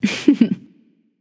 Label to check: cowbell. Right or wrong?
Wrong